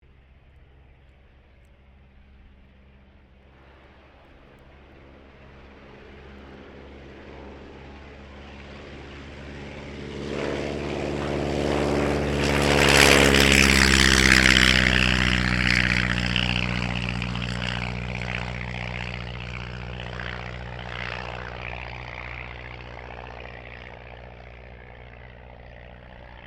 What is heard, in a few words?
AIRCRAFT RYAN STM-S2 TAKEOFF; 1939 Ryan STM; Airplanes; Vehicles